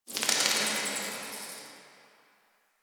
Bats; Cave; Ambience; Warframe; Stalker Cave

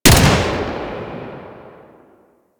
Flak Cannon Fire 3D A; Grineer Death Squad

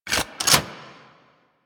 Drill To Flame Thrower Equip; Warframe; Grineer Ghouls; Ghoul Drill Sergeant; Attacks

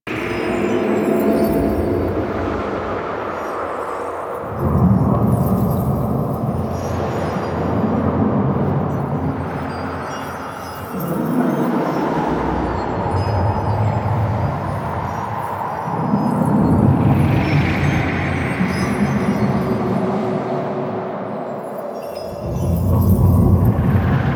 Moon Interior Ambience; Warframe; Orokin Moon

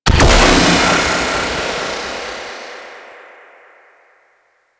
Pvp Cephalon Explosion; Explosions; Warframe

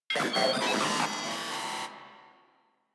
Moa Vocal Death; Enemies; Warframe

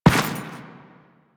footstep dirt; Enemies; Warframe; Teshin; DE

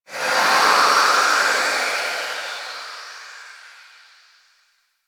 Town Steam Release, Ambience; Warframe; Duviri

